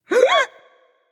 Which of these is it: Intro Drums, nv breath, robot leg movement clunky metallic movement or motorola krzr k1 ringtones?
nv breath